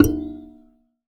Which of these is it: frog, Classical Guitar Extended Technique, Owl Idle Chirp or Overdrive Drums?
Classical Guitar Extended Technique